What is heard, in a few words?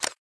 camera click; G5